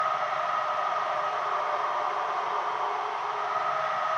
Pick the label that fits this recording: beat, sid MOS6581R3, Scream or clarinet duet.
Scream